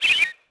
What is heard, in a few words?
bird; Tony Hawk's Project 8; XBOX